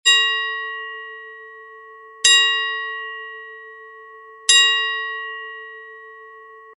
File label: bells bell steel ring